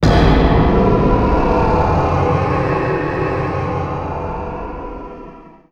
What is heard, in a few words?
grave chill target; visage